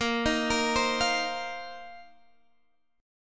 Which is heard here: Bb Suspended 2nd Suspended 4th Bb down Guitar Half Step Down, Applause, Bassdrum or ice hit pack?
Bb Suspended 2nd Suspended 4th Bb down Guitar Half Step Down